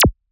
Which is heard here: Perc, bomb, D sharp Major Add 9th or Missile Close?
Perc